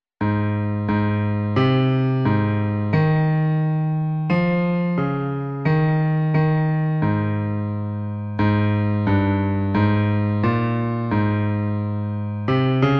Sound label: Bass